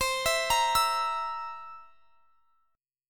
C 7th C down, C7; arpeggiated

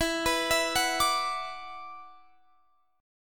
F sharp 7th Suspended 4th Sharp 5th E

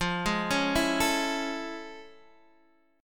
A Major 7th F; AM7/F Chord {X 8 6 6 5 5} arpeggiated

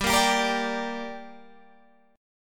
G Minor G down Guitar Open G, chord

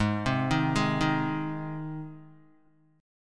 Ab Major Ab down Guitar; arpeggiated; JGuitar

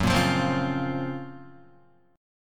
F sharp Major Sharp 11th F sharp, Guitar; strummed; JGuitar

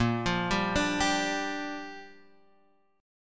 A sharp 6th; arpeggiated; JGuitar

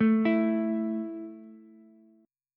A 5th A down Ukulele, A5 Chord; arpeggiated; JGuitar

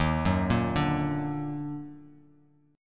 D sharp Minor Major 7th, Bass Standard; arpeggiated

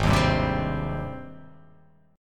G sharp Major 7th G sharp

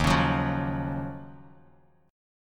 Eb Minor 9th Eb Bass Standard; Ebm9; strummed; JGuitar

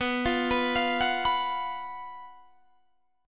F sharp Major 7th Suspended 4th, B, chord

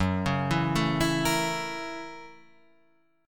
F sharp 7th Sharp 9th

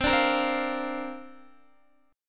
C 7th Suspended 2nd Suspended 4th C down Guitar

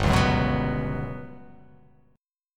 G Major 7th Flat 5th

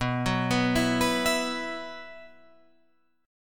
B Suspended 4th, Guitar, Drop D

